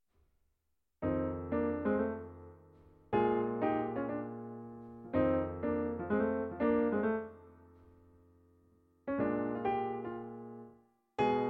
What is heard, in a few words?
blues, jazz piano